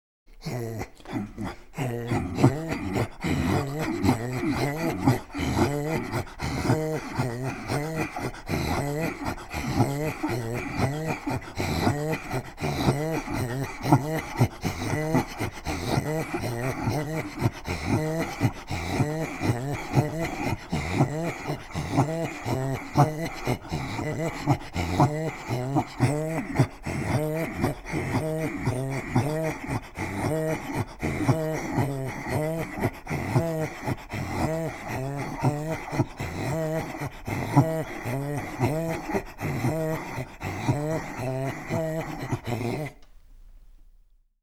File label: Throat Singing, INUIT; Harngaq